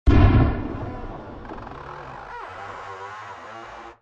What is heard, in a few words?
muffled explosion